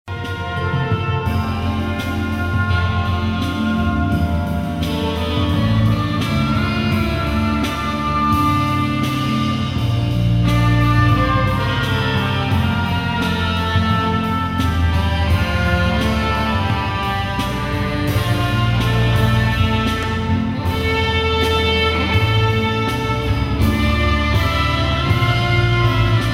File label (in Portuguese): ORQUESTRA